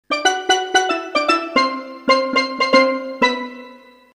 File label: steel